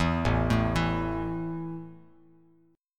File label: A 5th A down Bass Standard; arpeggiated